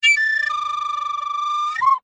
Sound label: musical instrument recorder toot stutter